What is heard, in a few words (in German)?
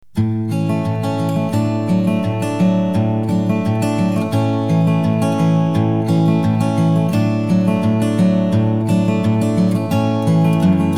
Gitarre Solo